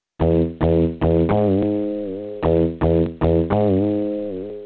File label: oberheim